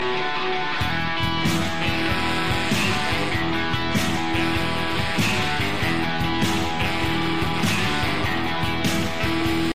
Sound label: Southern Rock